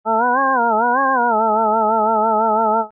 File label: vibrato, balso akustika